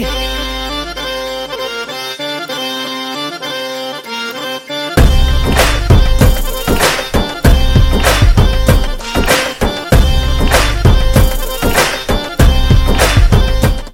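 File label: BG Violin Tone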